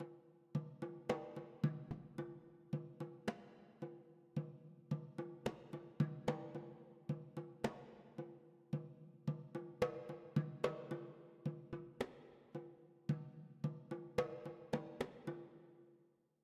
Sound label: Drums Mid; Frozen Flame; windows